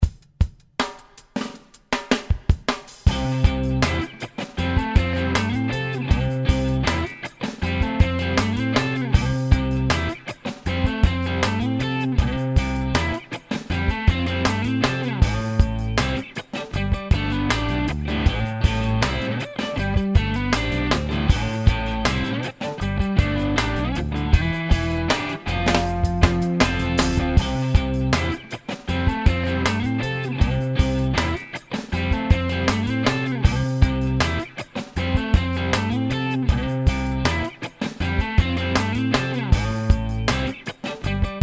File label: Ballad